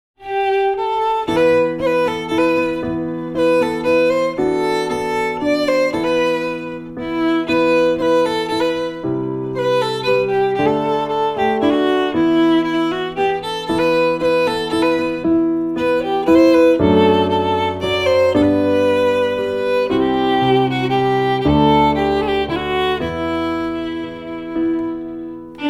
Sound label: waltz; cassandra